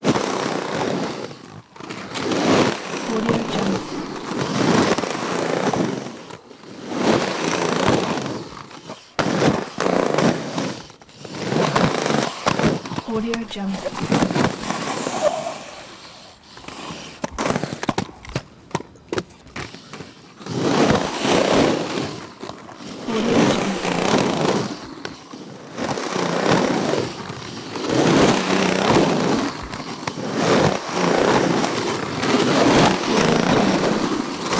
roller skate single person banking

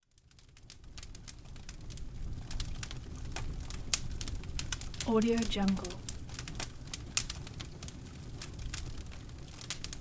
campfire

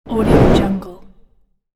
fast whoosh

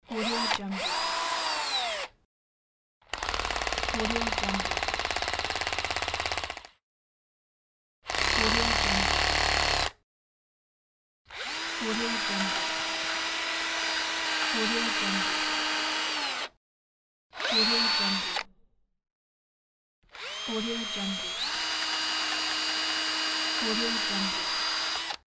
drill

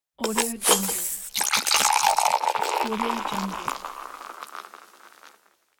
beer pouring

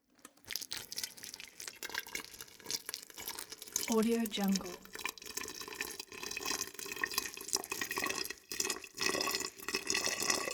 slowly pouring water into hot water bag

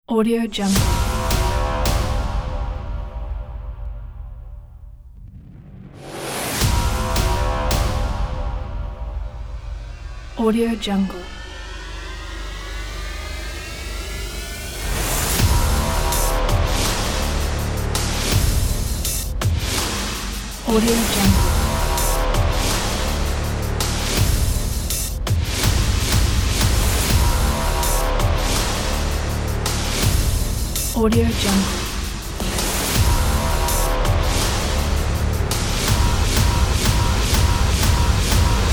epic rising trailer intro

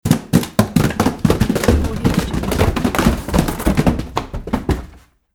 cardboard boxes falling down stairs; electronplanet

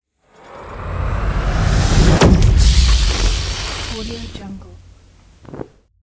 electrical ident

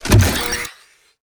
door spaceship small; soundmorph

